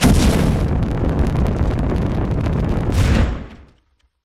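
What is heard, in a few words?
magic fire concentrate loop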